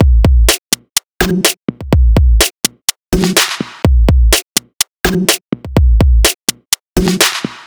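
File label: Combo D Kit A, break beat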